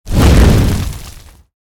Rock Throw FLAME; Evolve; Meteor Goliath

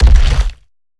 Climb Base; Evolve; Behemoth

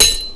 dagger metal

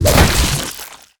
Ability Spider Trap Grab; Lurker; Evolve; Gorgon

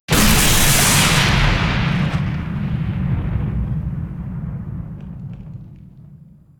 Lightning Strike Impact; Evolve; Kraken

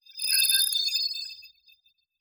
Warp Blast AIM Start; Evolve; Wraith

